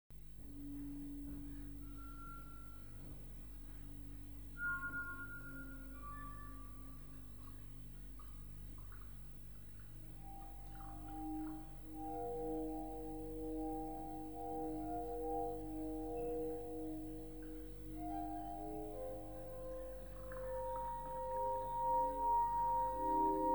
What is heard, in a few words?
euroglass